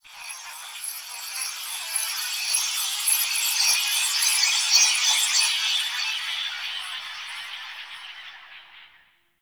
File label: Possessed Texture; Ambience; Warframe; Shadowgrapher